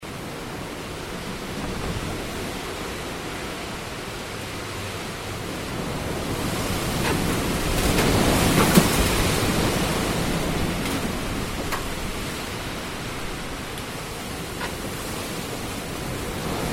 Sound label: wind; fieldrecs